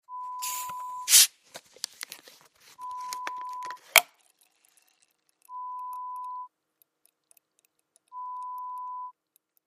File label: Sparkling Water